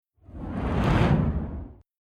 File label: Whoosh Fireball Long Slow Sweep By Left To Right Flame; Battle Sound Effects